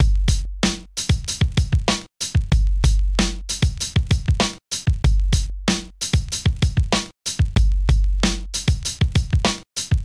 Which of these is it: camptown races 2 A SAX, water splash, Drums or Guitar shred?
Drums